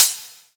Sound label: Hi-hat; 7rystan's 600+ FREE EDM SAMPLES & DRUMS; Percussion